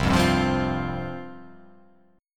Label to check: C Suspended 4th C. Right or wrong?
Right